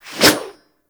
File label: Axe Small Swing; Warframe; Weapons; Swings